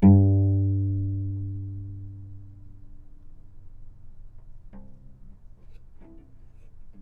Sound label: G2 - Pluck, novice cello